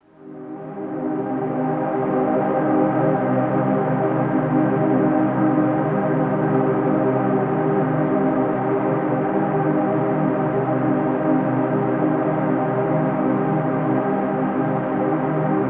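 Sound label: Pads, sustaining a C minor chord in two octaves; vocal samples; Void & Vista; Kontakt; Designed/Pads/A Quiet Night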